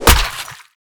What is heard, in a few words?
sharp heavy, impact; tfa starwars melee weapons pack; nmrihimpact